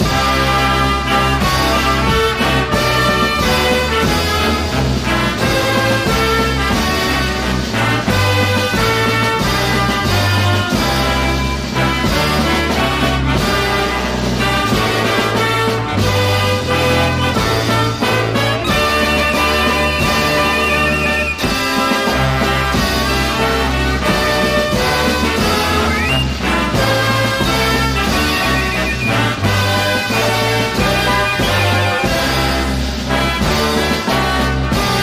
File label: FANFARE HYMNE; BORODINE